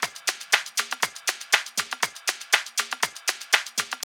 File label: house loop